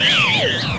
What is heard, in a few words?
CHAOTIC, Anime Sound FX; COMIC